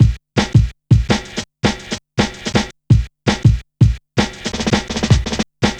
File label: Check A, Jungle, Drum Loops 165.5